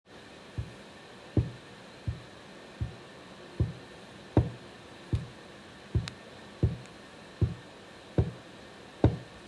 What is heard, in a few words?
Footsteps